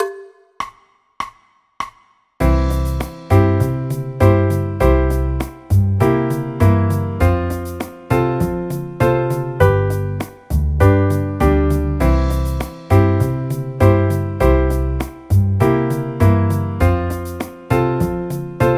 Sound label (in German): c dur akkordverbindungen